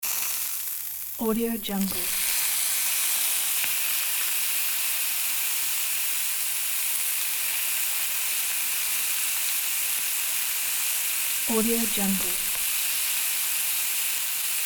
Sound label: sizzling meat cooking